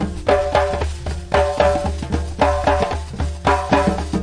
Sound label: 113 bush drums